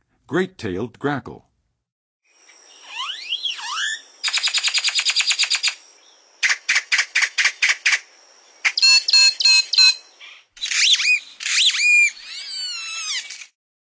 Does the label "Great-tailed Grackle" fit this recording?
Yes